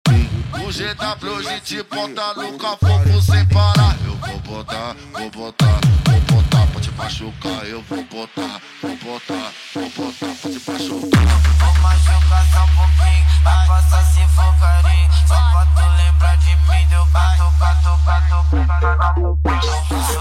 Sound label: funk bass